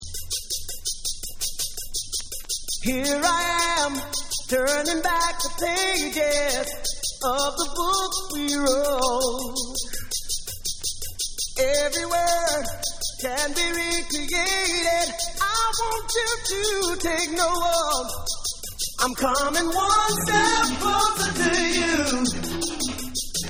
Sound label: Acapella